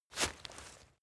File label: step leaves wet single; schritte